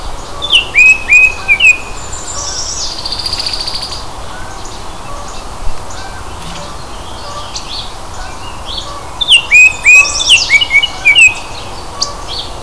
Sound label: oriole; Oriole 2008, version 1; zoology